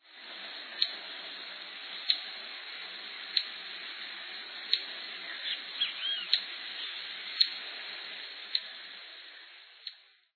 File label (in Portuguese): asa-de-sabre